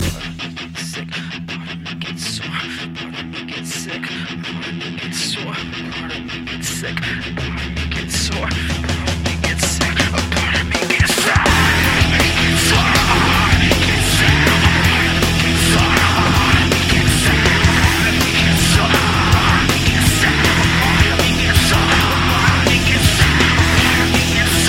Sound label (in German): Klingelton